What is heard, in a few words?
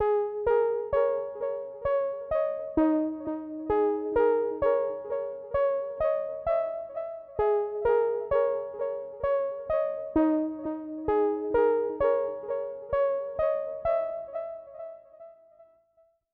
130 BPM G# Min Lead, loop stems; Melody; 2022 Melody Collection Platinum Expansion - Pt 1; Cymatics; Louis Vuitton